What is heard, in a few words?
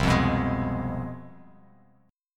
C Major 7th Suspended 4th Sharp 5th, C, down, Bass Standard; strummed; CM7sus4#5